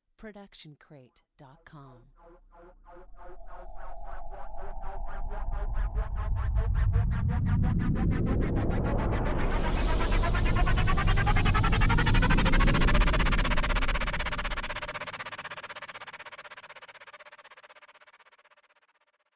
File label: Cinematic Riser